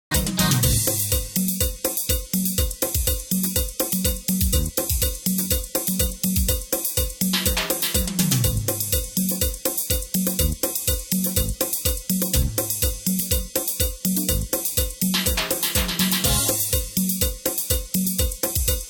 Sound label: cumbia